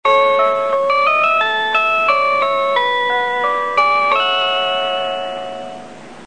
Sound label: train chime; 白鳥; 春の歌